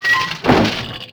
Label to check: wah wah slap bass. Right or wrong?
Wrong